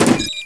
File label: mine drop